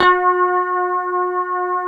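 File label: G 4 harmonic, guitar; Spectrasonics; Hans Zimmer Guitars VOL-1; 90's Sample CDs; HYPEMONICS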